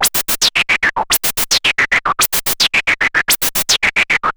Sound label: MiniBrute 110BPM, Gated Seq Noise